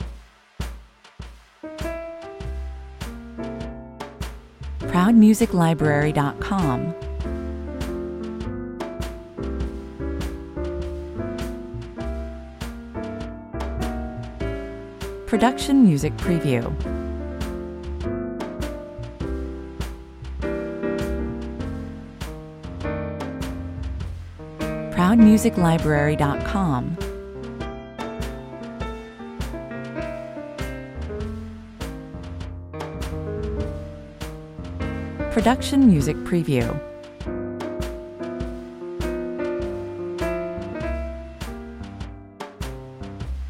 jazz piano swing 100 bpm

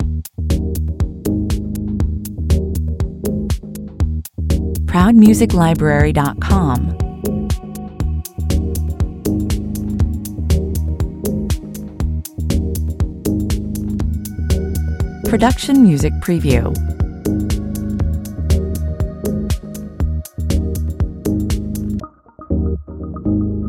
house on hold loops 120bpm

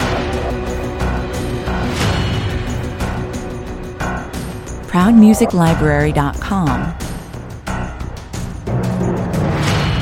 perc and synth

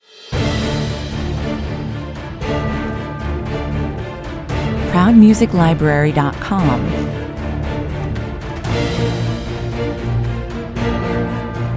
no choir high intensity

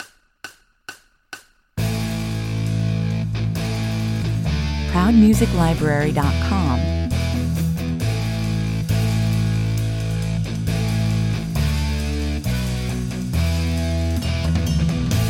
no lead guitar

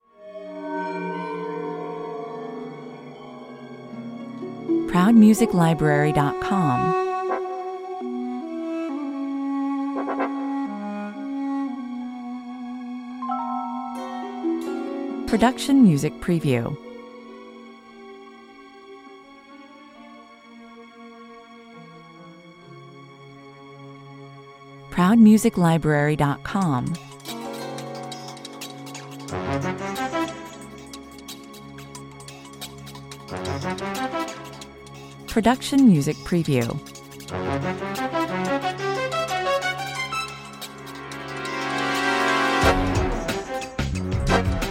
cat and mouse game suspense 90 bpm

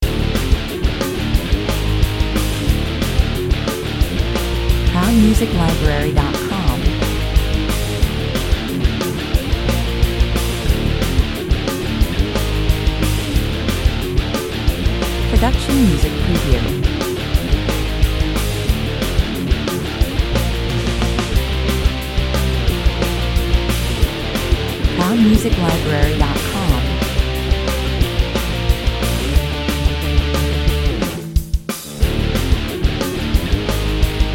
no lead, 90 bpm

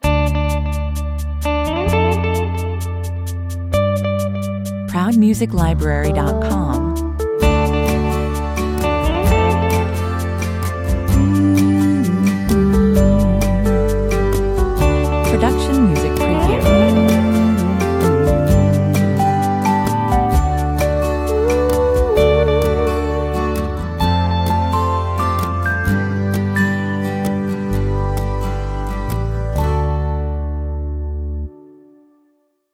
jeux (sweep version) 130 bpm

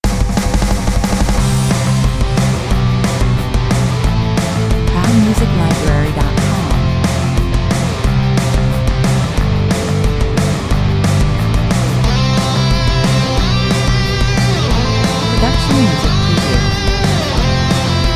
jingle bells rock 180 bpm

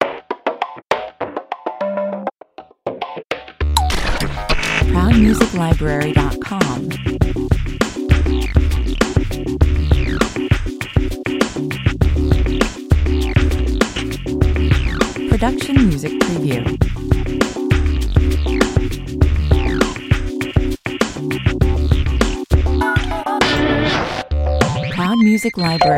concrete beat 100 bpm

